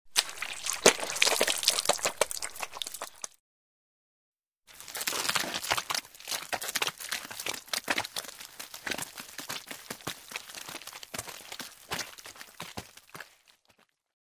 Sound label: Water splash